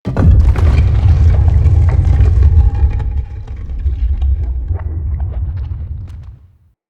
Throne Sink; Characters; Enemy; Mendicant; MndKing; Abilities

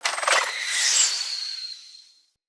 Bow Arrow Projectile Start; Sinecure

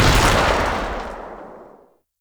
Shot, Rifle; Warframe; Weapons; Thanotech; Trumna Prime

